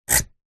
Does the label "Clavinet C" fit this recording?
No